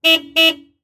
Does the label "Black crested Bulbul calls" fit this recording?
No